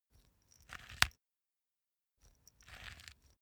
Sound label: small pepper grinder glass ringing hard; industrie-wirtschaft; gastronomie